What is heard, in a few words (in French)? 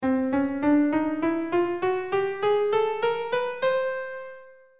gamme chromatique ascendante do